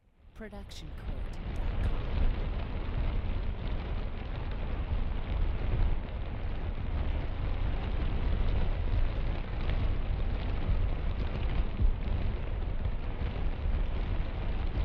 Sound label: Big Fire Rumble